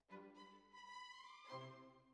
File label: Flute